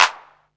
CLAP, Techno 138 BPM; Best Service ProSamples vol.54; AKAI

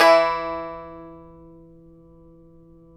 TAR; Roland - LA Composer vol. 3

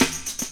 SN LOOP

